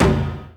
A#3 TIGHT, JAPANI PERC; Northstar; The Wizard VOL-1

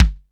JAZZ K, JAZZ KITS; Crypton; DrumBase5000

